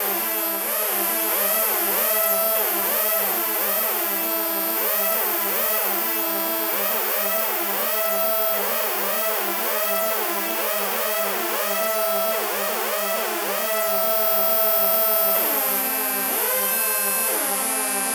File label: nord alarm long